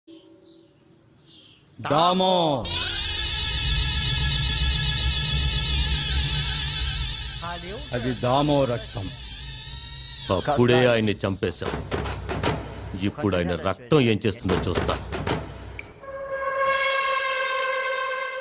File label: music